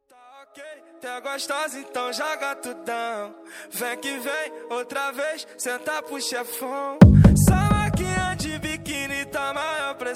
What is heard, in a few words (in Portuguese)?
mega funk; maxs rs; sem vinheta